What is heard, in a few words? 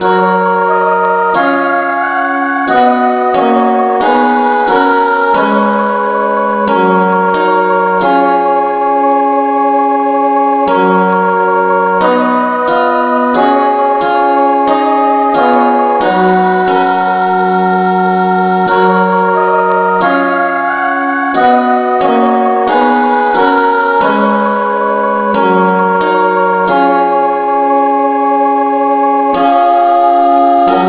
no vocal key ges